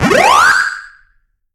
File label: Ghost Eat; Pac-Man Championship Edition 2 Plus